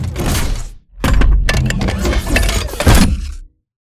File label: bt embark casual right; Titanfall 2